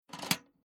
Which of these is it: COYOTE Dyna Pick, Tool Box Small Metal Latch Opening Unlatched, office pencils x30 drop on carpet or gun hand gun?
Tool Box Small Metal Latch Opening Unlatched